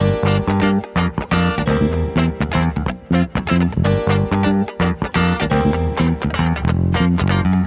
backing, Disco; Best Service ProSamples vol.48 - Disco Fever; AKAI

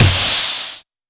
TR909 Snr, drum machines; Roland SH-32; Drumset 04

